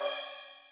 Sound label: ride, Millenium MPS-400